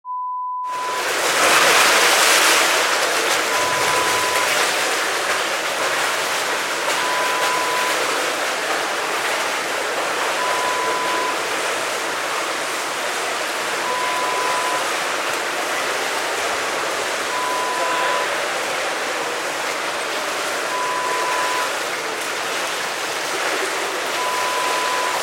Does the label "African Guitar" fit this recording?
No